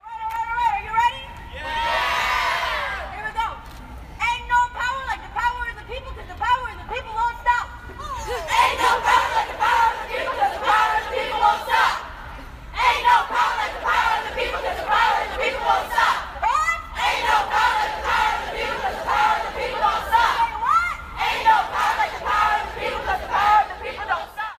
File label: chant